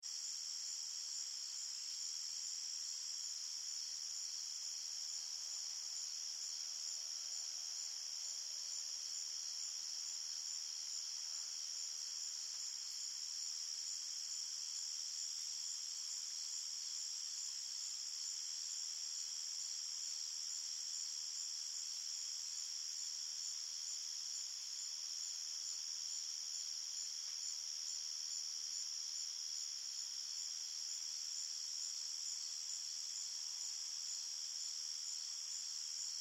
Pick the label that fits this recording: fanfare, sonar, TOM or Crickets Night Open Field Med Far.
Crickets Night Open Field Med Far